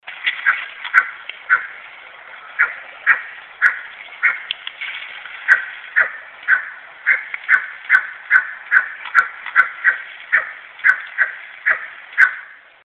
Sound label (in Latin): asio clamator